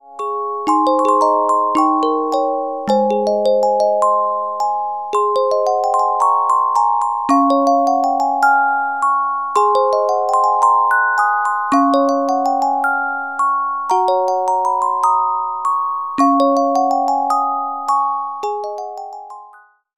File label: Carillon Music Box Melodies